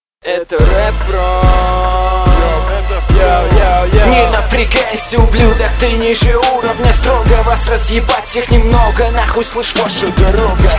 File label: black rap; stomp